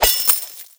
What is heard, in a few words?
Coin Count End; netent